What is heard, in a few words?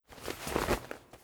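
Ara Parrot move; Ara Parrotmoove5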